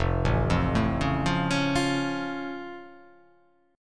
F Augmented F

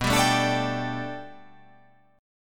B Minor 7th Sharp 5th B, chord

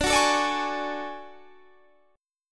D Major Sharp 11th D Guitar Standard, chord; strummed; JGuitar

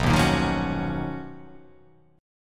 B Major Sharp 11th

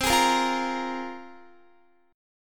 C 7th Suspended 4th Sharp 5th, Guitar

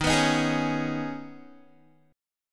Eb 7th Suspended 4th Sharp 5th, Guitar; Eb7sus4#5; strummed

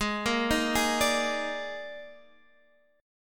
D sharp Major 7th Suspended 4th G sharp, chord

